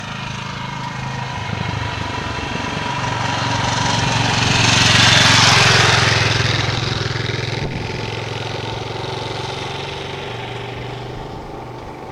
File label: Motorcycle